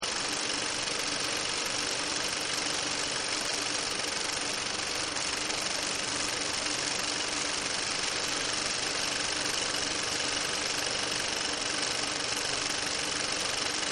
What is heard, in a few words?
Film Projector; Projectors; Offices; Partners In Rhyme